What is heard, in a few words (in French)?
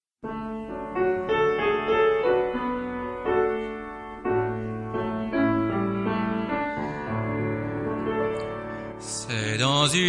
la chanson des guitares